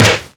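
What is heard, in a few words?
natural snare sound a key, tuned to the A note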